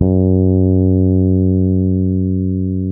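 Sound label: F#3, fretless, bass; Spectrasonics; Bass Legends CD 1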